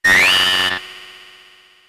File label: enemy beam; coronia; SNES; SMRPG